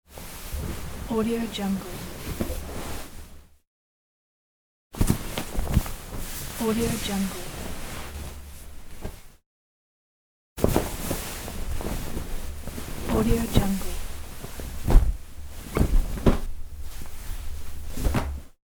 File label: tossing and turning in bed